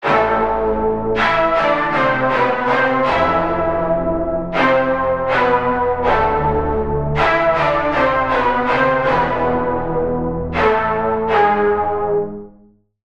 lead, Sylenth1; Routed Sound; Sylenth1 Expansion - Street Vanquisher Vol.1

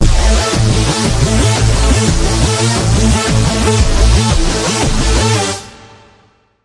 Lead, Spire; Routed Sound; Spire Expansion - Quantum Enforcer Vol.1

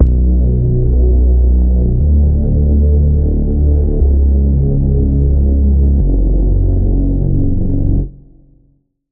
Reese; Routed Sound; Spire Expansion - Street Vanquisher Vol.1

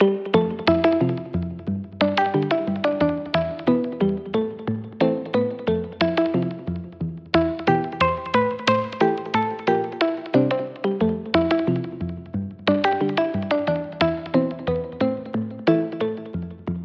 Pluck - Blip; Routed Sound; Vital Expansion - Story Walker Vol.1

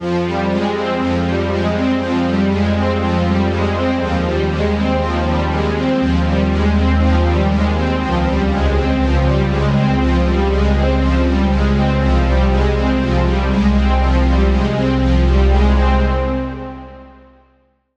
Lead, Spire; Routed Sound; Spire Expansion - Reality Maker Vol.1

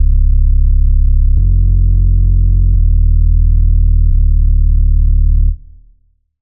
Bass, Fundamental Sub; Routed Sound; Sylenth1 Expansion; Chaos Bringer Vol.1

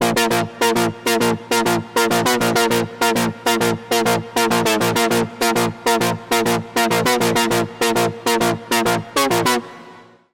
Lead; Routed Sound; Spire Expansion - Doom Carpenter Vol.1

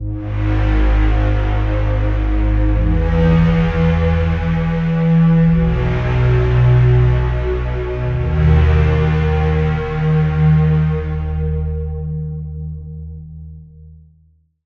Pad; Routed Sound; Serum 2 Expansion - Reality Maker Vol.1

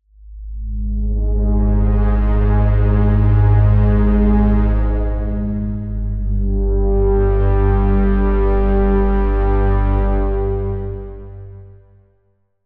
Pad; Routed Sound; Sylenth1 Expansion - Quantum Enforcer Vol.1

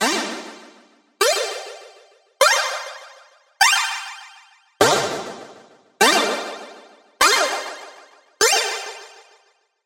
SFX, Bending Curve; Routed Sound; Spire Expansion; Hyper Gamer Vol.1

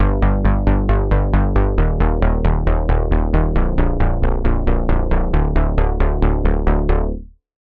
Bass - Robo Sound; Routed Sound; Vital Expansion - Reality Maker Vol.1

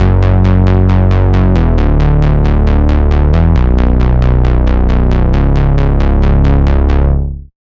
Bass; Routed Sound; Serum 2 Expansion - Reality Maker Vol.1

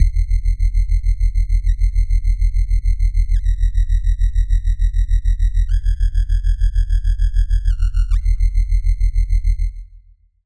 SFX Punch Shock; Routed Sound; Sylenth1 Expansion - Doom Carpenter Vol.1

